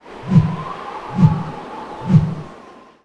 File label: windmill